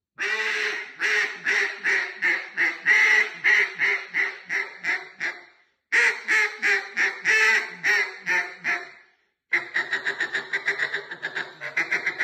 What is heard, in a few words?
DUCK SOUND; CHAOS-INJECTED